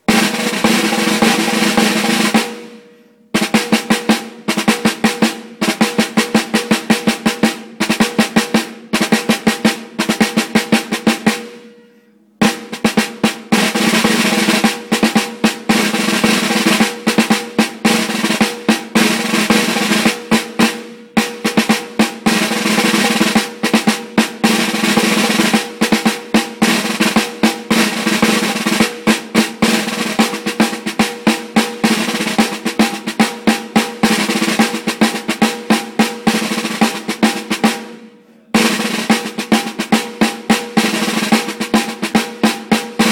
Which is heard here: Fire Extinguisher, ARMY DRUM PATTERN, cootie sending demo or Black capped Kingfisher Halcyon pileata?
ARMY DRUM PATTERN